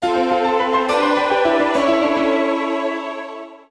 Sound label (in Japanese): ジングル：詩人